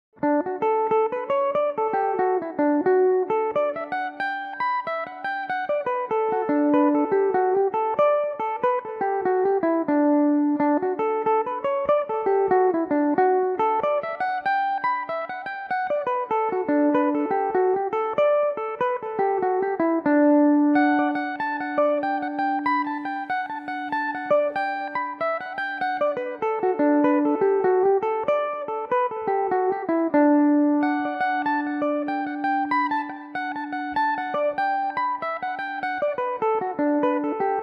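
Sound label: D major belmuse, irish mandolin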